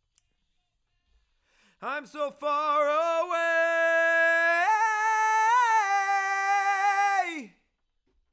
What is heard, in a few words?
Chorus